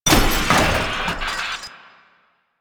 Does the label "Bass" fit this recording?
No